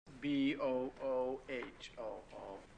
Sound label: boohoo